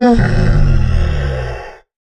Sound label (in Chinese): 骆驼坐下声